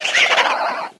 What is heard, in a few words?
Metroid Vox